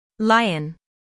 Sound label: lion; wild animals